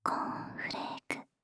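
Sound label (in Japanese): コーンフレーク, 無声音ASMR, バイノーラル音声; シャッフル睡眠ランダム再生用; シャッフル睡眠法で眠ろう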